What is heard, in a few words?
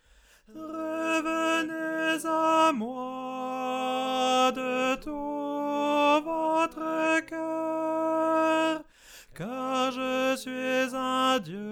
ensemble, Chorale